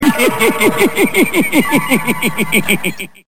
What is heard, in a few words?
laugh